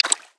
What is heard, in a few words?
Fish Cut Line